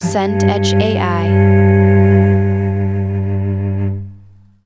cello note low

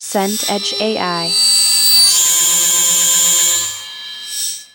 cymbal bow shimmer

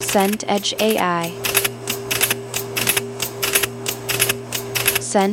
photocopier run

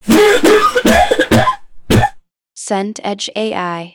cough fit

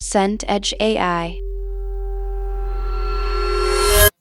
impact reverse

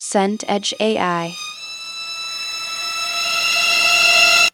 riser orchestral

